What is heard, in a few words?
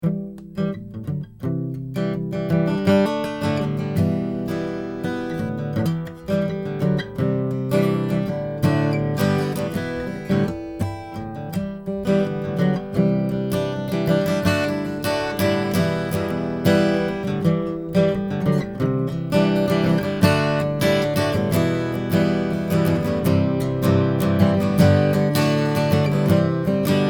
C12 CARD Em Improv; SANTA CRUZ OM/PW AC. GUITAR; straight signal with no additional EQ or effects; AKG C12VR